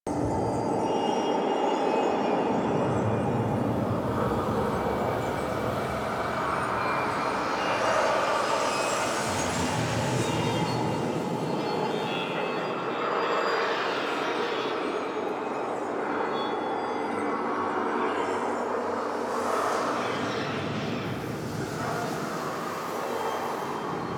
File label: Ambient Loop, Ambience; Apostasy